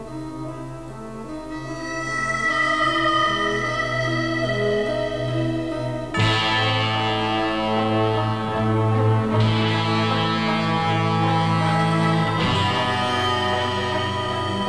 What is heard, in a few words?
harmonica; Spaghetti western